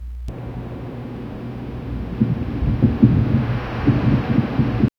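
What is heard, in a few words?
ambience low rumbling wind, creak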